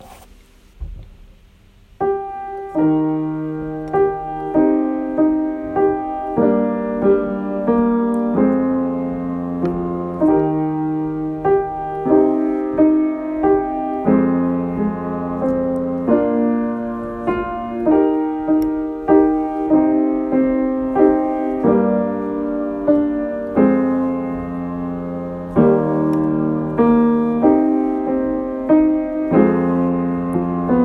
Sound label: instrumental